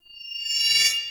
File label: Cannister Throw Riser; Enemies; Abilities; Warframe; Tau; Dax Archer